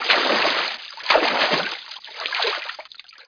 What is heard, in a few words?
SWIM OUT; OUTLAWS